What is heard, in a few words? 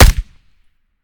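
akilo47 suppressor player, weapons; viper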